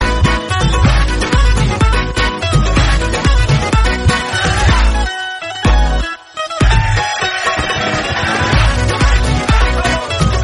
Instrumental Ringtone

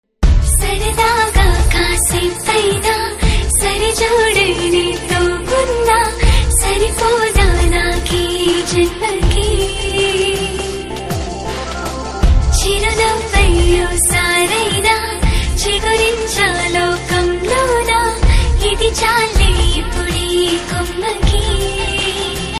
Female Version Ringtone

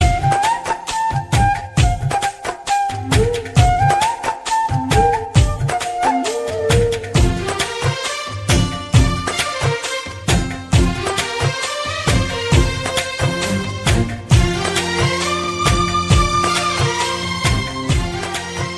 Instrumental BGM Ringtone; Simhadri

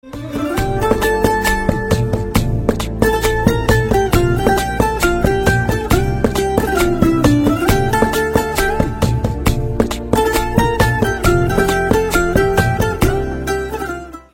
BGM Instrumental Ringtone

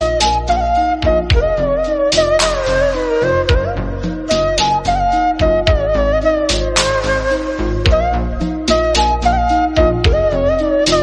Flute BGM Ringtone; Roja

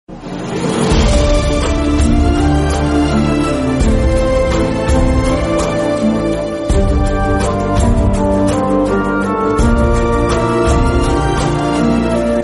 Interval BGM Ringtone; Businessman

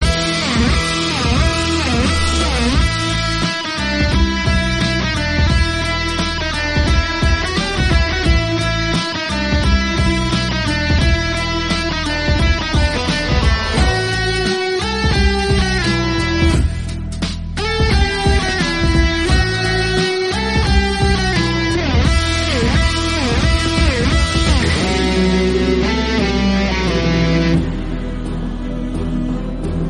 Electric Guitar Ringtone; Scam 1992

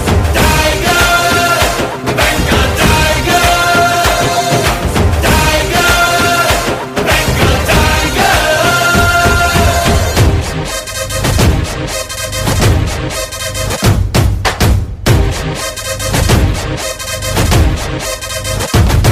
Theme; Bengal Tiger

